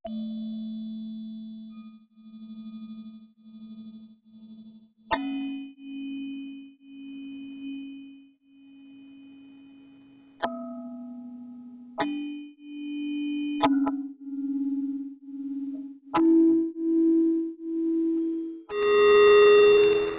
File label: TELOMERE; Lost Frog Productions; lolicore